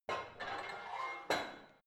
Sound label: barbell heavy plate put on creaky, fitnessstudio